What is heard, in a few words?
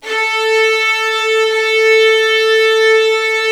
Strings; ILIO; Kirk Hunter Virtuoso Strings